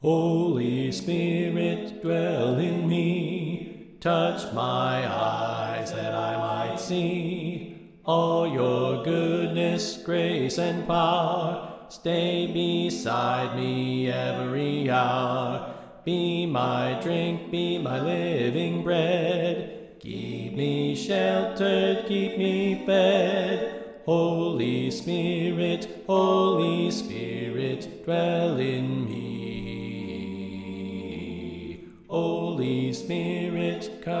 Bass Left

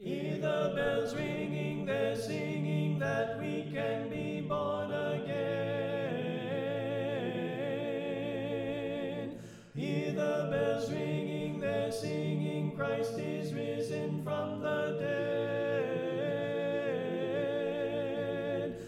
Alto Left

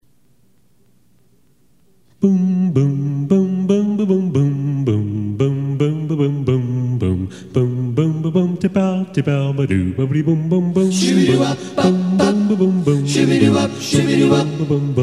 Good Ol' Acappella

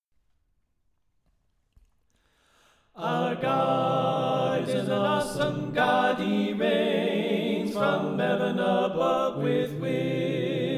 Alto Left

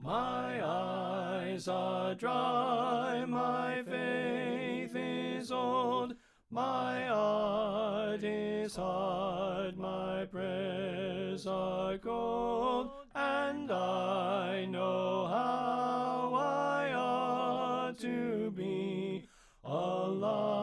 Tenor Left

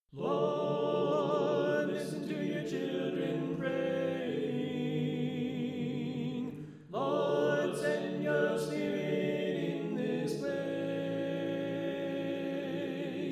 Alto Left

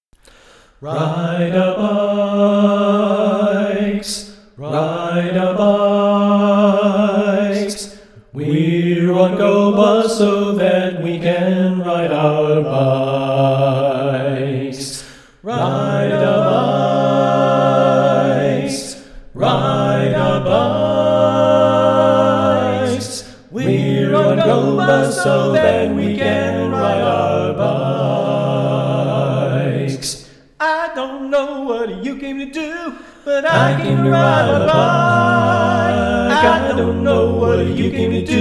Reverb

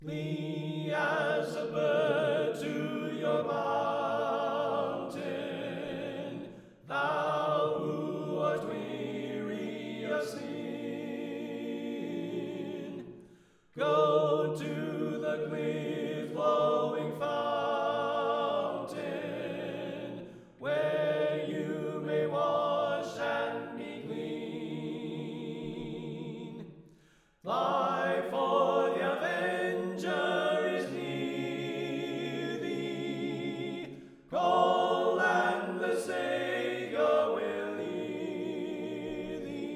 Alto Left

